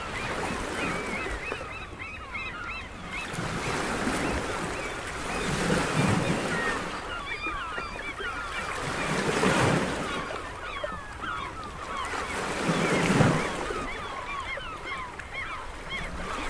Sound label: ocean & seagulls